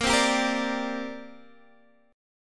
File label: C 7th Suspended 2nd Bb